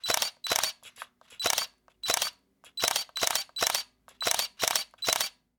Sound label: camera shutter